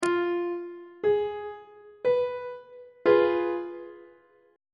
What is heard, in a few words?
f diminished chord on treble clef